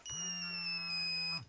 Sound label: nokia 5510 ringtones rocket